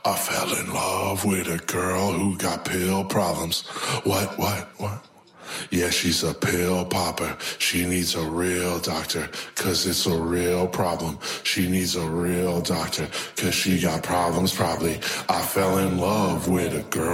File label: accapella